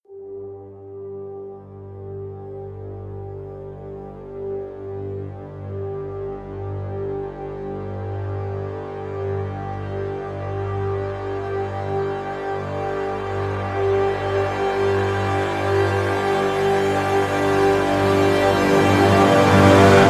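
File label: crescendo brass swell G; brand x music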